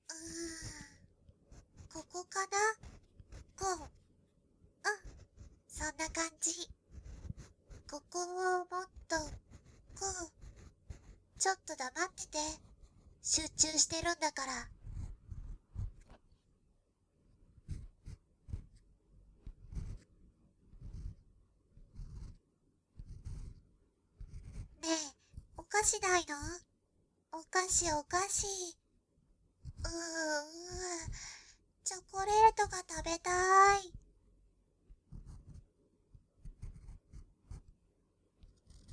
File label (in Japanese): 耳かき B; イリヤの耳かき